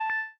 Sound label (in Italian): Fiore; BLIND QUEST - The Enchanted Castle